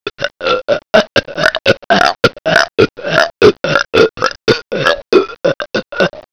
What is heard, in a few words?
burp